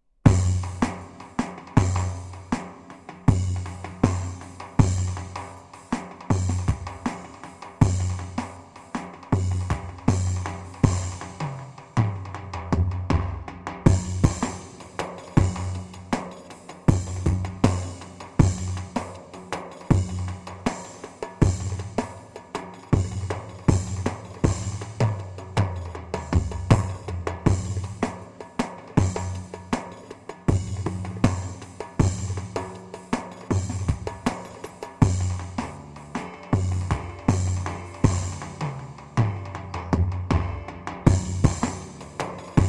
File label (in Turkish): zeybek; tr karisik ritimler